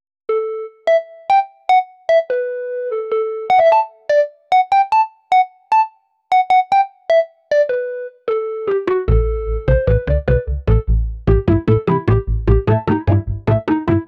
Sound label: a kpop fast rnb melody